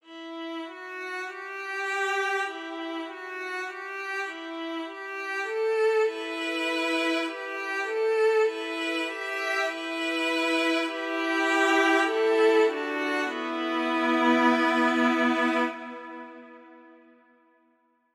Sad Viola

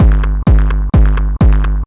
Tech loop

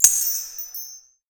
Mystic Spill

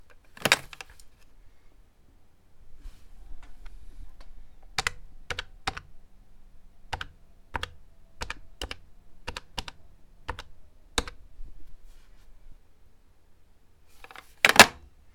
Phone

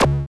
Hit Hurt

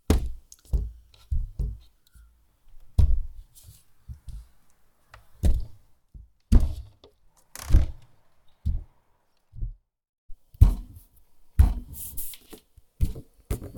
Window glass sounds

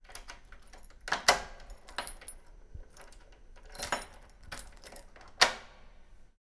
Unlock Door No Effect

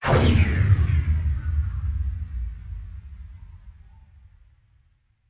Blowing Deploy Whoosh

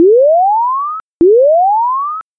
Warning Sound

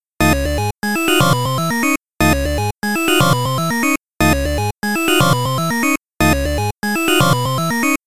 Retro Gaming

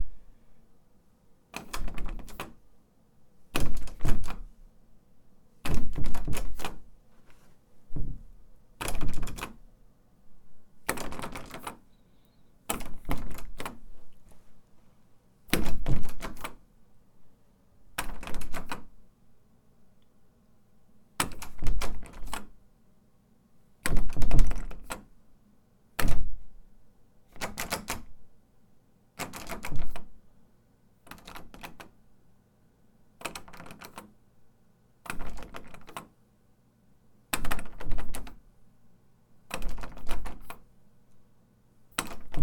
Locked Door Handling

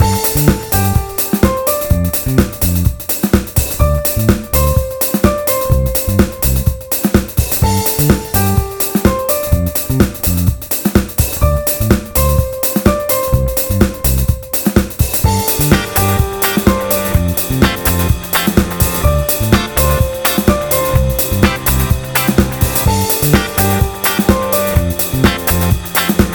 Flying Disco Loop

